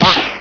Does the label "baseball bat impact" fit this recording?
Yes